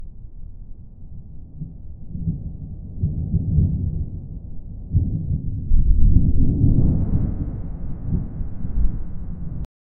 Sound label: wind howling thunder